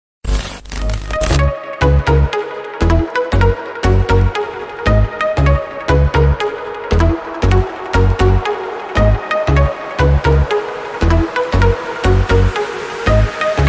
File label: reverb